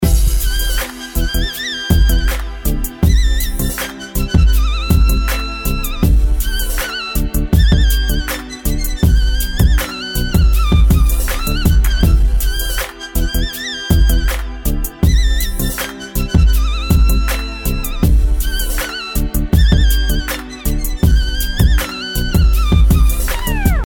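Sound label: flute sounds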